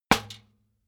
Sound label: trash basket metal hit bottom hard